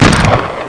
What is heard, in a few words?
KEY EXPLO